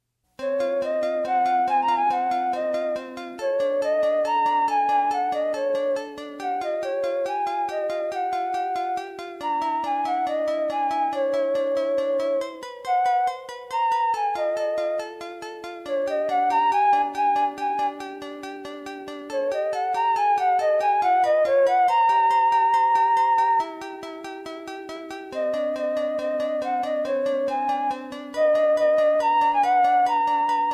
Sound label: six tone piece